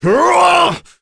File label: Vox Attack; kings raid; voices heroes en